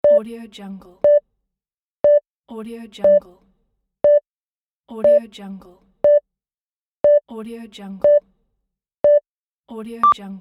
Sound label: countdown beep